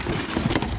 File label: slide; XBOX; Freestyle Street Soccer; In Game